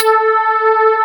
wet bass; Wizoo - Powered DX

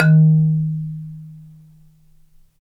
Marimba; Roland LCDP11; Africa VOL-1; Af.Marimbas

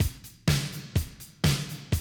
125, Classic Drum Loops

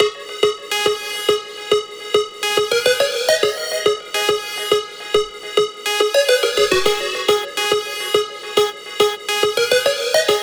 140BPM G#; Vengeance - Essential Clubsounds Vol.4

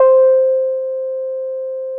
C6, Bass; E-MU Producer Series Vol. 6 – Analogia Project (CD 1); Boom da Bass 3

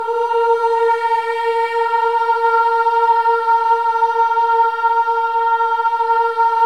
OH EH, Symphony of Voices; Spectrasonics

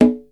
MD CNGA SL, CONGA; AKAI S6000 CD-ROM - Volume 5

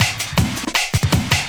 LOOP L, Drum & Bass 160 BPM

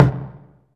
PER, Percussion

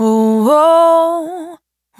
Urban Dry, Single Voice Melodic Loops

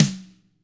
TAMA RIM, Drums; ILIO; Double Platinum Drums 2

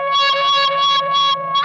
OVER WAH, Funk Guitar; 90 s Sample CDs; Zero G

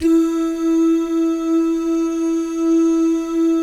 DOO MIX, Vocal Planet Jazz, GUY GAL; ILIO; Jazz & FX

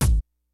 KICKS; Kid Nepro; Elite; KICKS SNARES

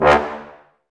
Woodwind Brass Ensemble; Miroslav Vitous

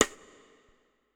Kit, breakcore Drum Hits

